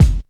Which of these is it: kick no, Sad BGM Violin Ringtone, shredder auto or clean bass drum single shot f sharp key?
clean bass drum single shot f sharp key